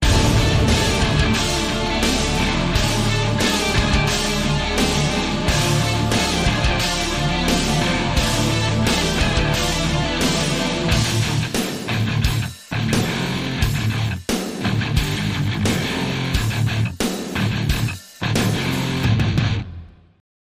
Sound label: Metal Attack